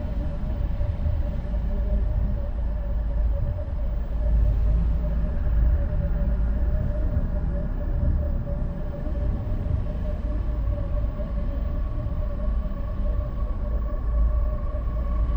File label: incoming diplomatic shroud amb; Stellaris; utopia